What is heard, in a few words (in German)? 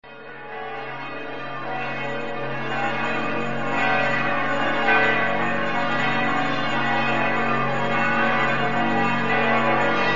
glocken